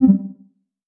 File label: delete